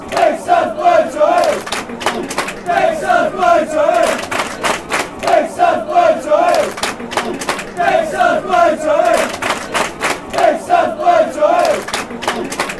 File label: blackburn chant